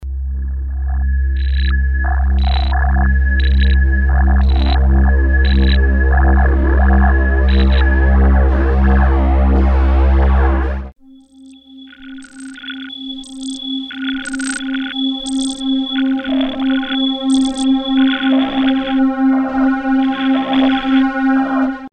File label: pad layer, korg prophecy; synth